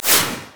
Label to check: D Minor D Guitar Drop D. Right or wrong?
Wrong